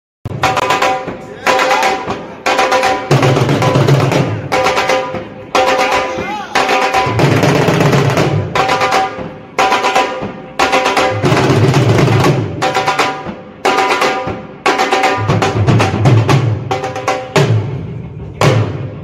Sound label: dhol